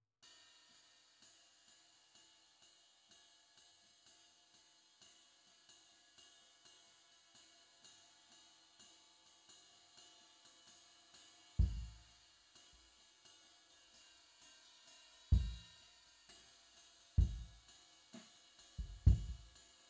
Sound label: Kick IN